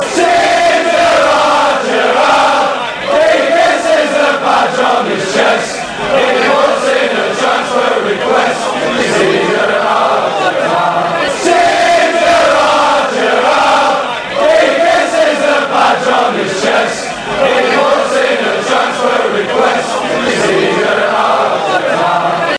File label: chant a manchester united song; steve gerrard kisses the badge on his chest